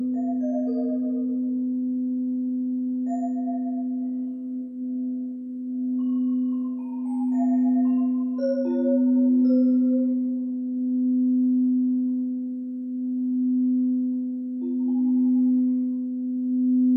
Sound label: with alchemy singing bowls